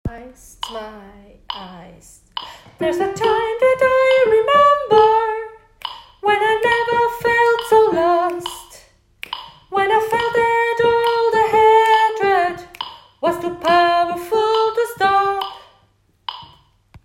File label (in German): Sopran 2 Strophe